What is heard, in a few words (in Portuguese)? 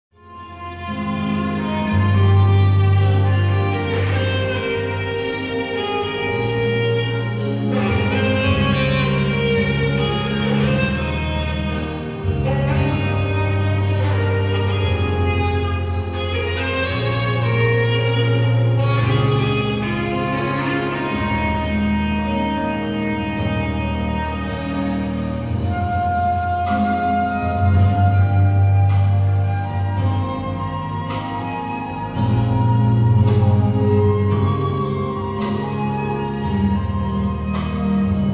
ORQUESTRA